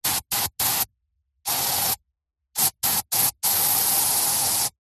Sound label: household aerosol spray; zapsplat